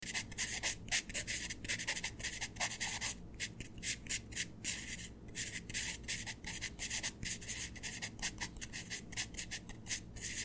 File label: paper sound; medievalgame